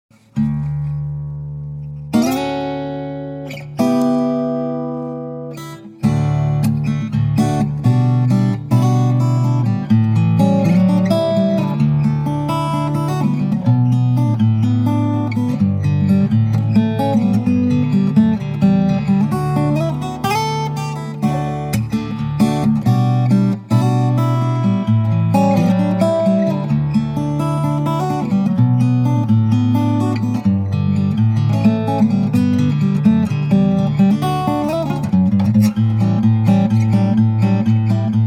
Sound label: Guitar